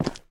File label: Rock; lethal company